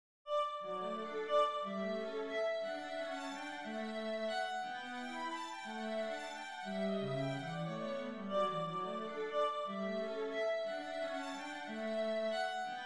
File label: gigue in d